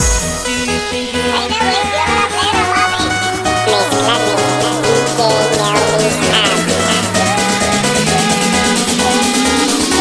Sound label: Remix